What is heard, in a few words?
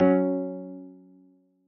List Match Multiple; Oxygen; plasma